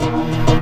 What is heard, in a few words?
busy, signals; AKAI; FX SIGNALS